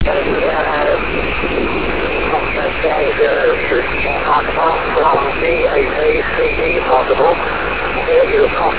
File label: 47ghz ssb